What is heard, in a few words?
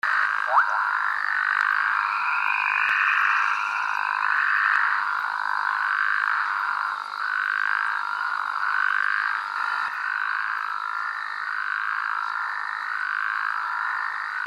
frogs tropical evening